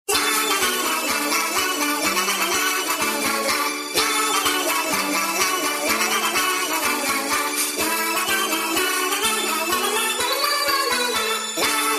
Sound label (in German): kindlich tones